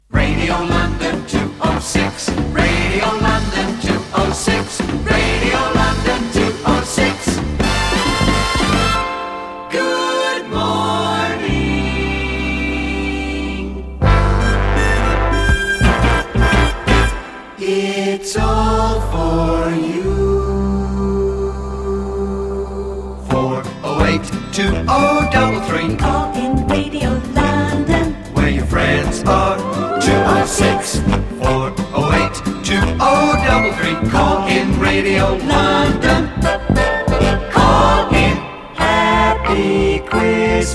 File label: seventies jingles